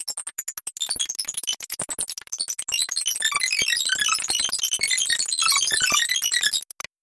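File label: electronic data transmission sounds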